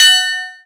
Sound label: green light, red light green light